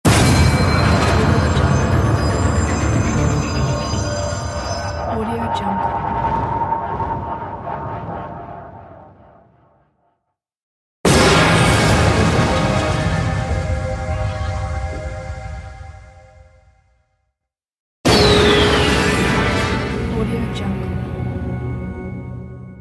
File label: dark rise impact